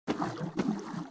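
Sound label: footstep walk loop water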